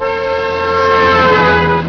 ZOOM BY

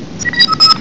doorbell

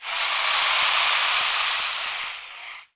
CHEERING; EFFEKTE; MAGIX

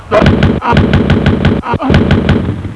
SHOOT

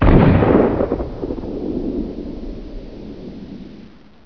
EXPLOD

